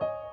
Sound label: piano high